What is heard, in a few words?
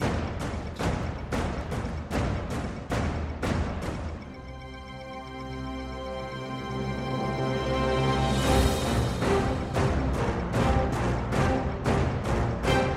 force rising bpm 114